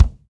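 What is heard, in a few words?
BD, bass drum; Best Service Gigapack 2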